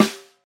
phat acoustic snare sound g sharp key

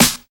old school hip hop steel snare drum sample D# key

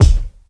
thick steel kick drum c sharp key; tuned kick drums

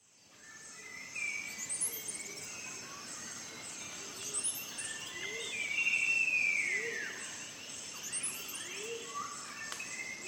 hornbills - fruit pigeons - piping crows; soundscapes

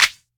clap sound a sharp key; percussion; single hits one shots

